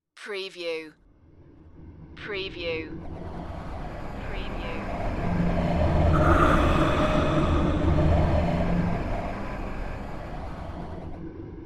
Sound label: evil space breath